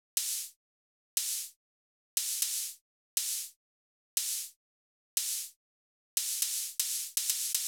Hat Op Dirty; hydrogen

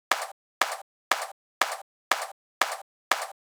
Clap; hydrogen

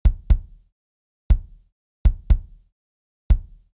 Kick; hydrogen

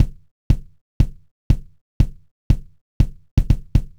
Kick; hydrogen

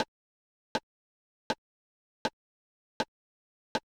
TR707 Rim; hydrogen

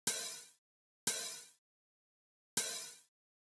Open HH; hydrogen

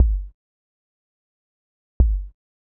Kick Low; hydrogen

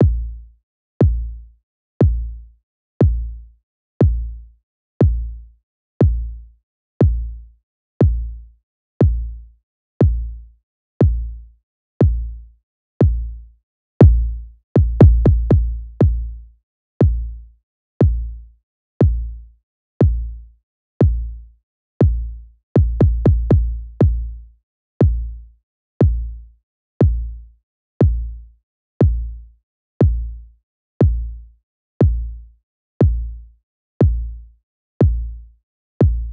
kick; hydrogen

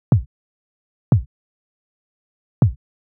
Kick Lite; hydrogen

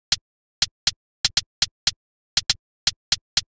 Closed HH; hydrogen

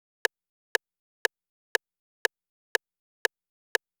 808 rim; hydrogen

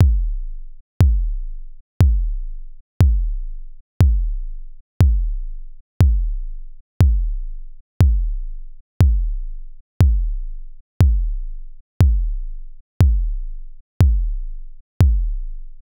Kicker; lmms; madmans

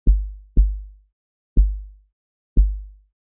Kick; VariBreaks; hydrogen

